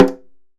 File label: Snare Drum; Percussion Samples